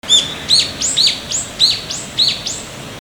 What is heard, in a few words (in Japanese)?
hiyodori